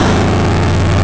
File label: Spark Shock; A spark shocking; A spark shocking Tingle; zelda